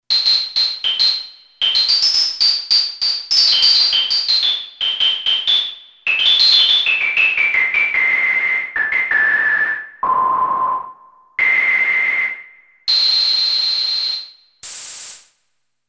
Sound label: Tuned Noise